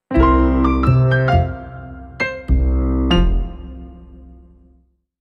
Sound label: Happy Hodge Podge, Sting 1